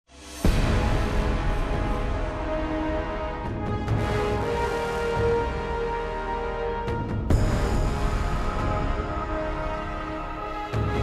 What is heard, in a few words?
Win; apex legends season 12; Wraith